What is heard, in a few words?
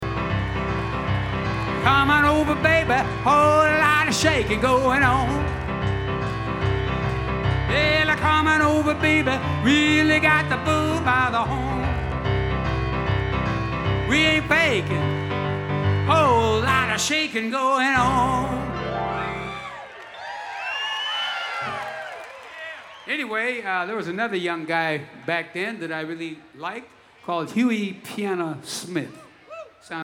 Piano Moment